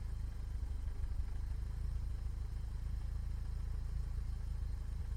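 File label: engine idle loop; Blind Drive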